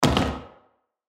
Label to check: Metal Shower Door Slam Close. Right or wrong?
Right